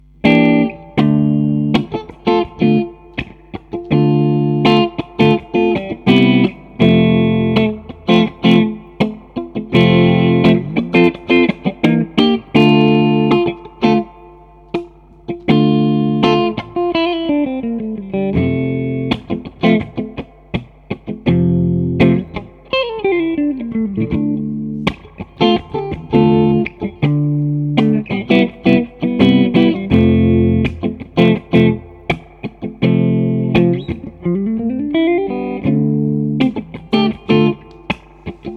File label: clean neutral